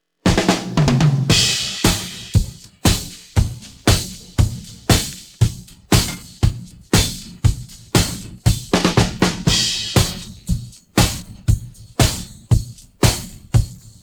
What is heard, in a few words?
drums pattern